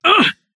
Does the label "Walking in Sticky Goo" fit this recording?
Yes